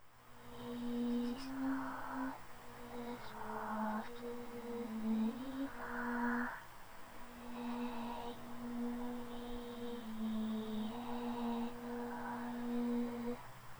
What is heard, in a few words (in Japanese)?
warabe; ひっそりしたわらべうた２